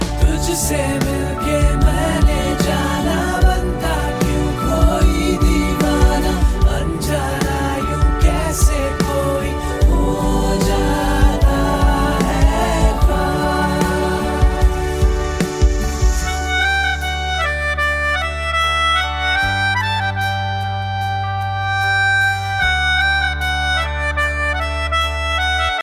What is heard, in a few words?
hindi love ringtone